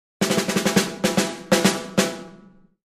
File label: Drums Snare March